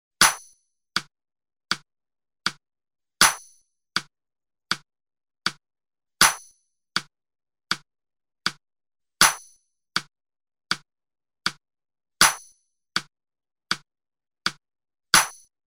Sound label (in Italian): ritmo quaternario; esercizio; Sonora vol A